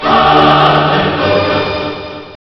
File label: hallelujah; torchlight